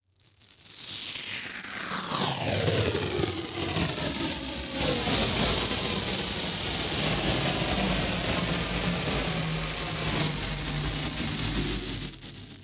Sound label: Industry Tool Tape Duct Tape Taped Together Peeled Apart Slowly